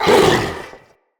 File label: Corrupted Battle Dog Pain, Animals, Vocals